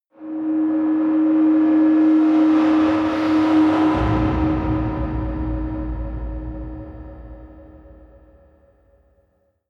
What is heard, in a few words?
whoosh scifi hum